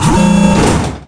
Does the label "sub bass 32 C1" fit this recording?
No